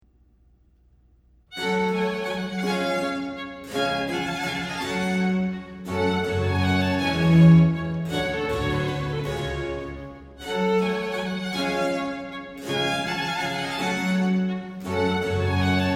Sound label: Vivace, Concerto Grosso Op 6 no 8 in G minor; Arcangelo Corelli; Christmas